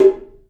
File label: 3D Percussives, 3D Ethnics; E-MU Producer Series Vol. 5 – 3-D Audio Collection